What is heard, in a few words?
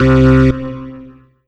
Yamaha TG500 OR Dist C2